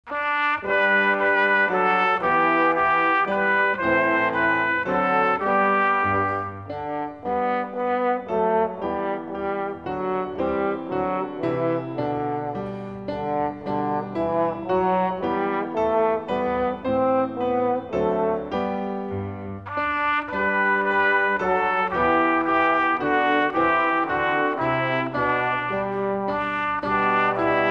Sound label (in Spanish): musica